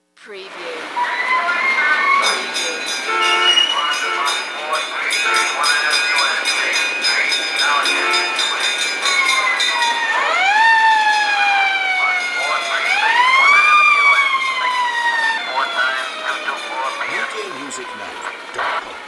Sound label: Fire Engine Siren Bell Radio